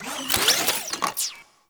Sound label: Drone Switch In; Warframe; Deimos; Cephalon Drone